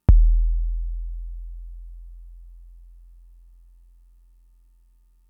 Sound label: Kick, Drum Machines Fricke MFB-522